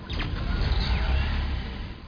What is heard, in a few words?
tele far; quake